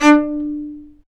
STR, Chamber Marc; String Master Series; Combos 4

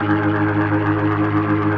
B3, First Call Vintage Keyboards

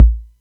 Atlantex Music Percussion Computer Kick

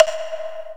rev block; Greytsounds VOL-1; PROCESSDRUMS

